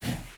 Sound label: step slide, zombie; creatures